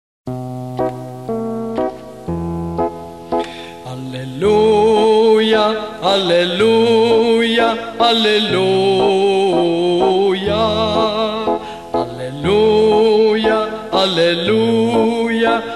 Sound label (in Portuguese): aleluia anuncio da ressurreicao; oficio divino cebs